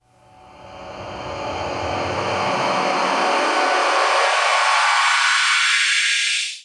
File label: Uplifter